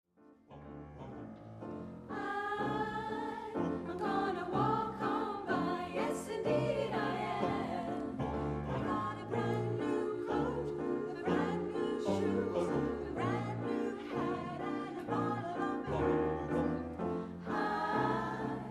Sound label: satb